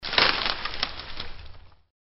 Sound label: falling; pair of kings; king of the climb